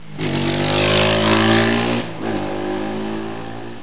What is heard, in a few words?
SCOOTER; 2000 Sound Effects; MISCVHCL